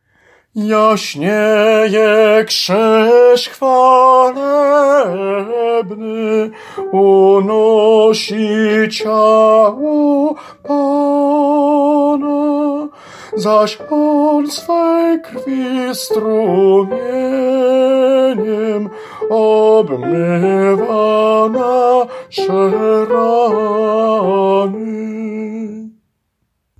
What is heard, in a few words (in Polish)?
TENOR; Jasnieje krzyz